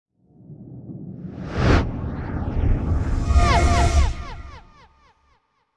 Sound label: UFO whoosh sound